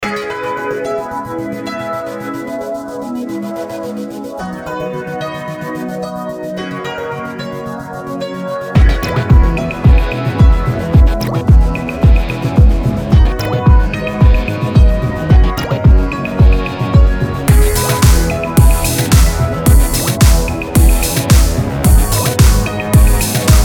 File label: pop